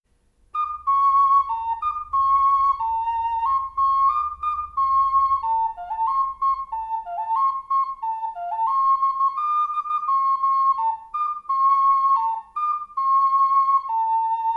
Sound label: Vogel Ocarina; Rotter; MuHaBuch 55; Laterne; Stennes-Falter